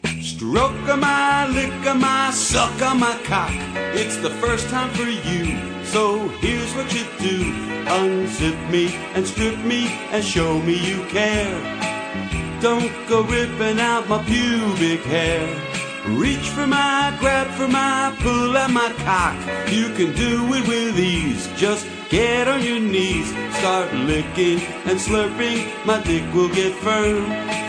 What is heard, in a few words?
jingle on my bells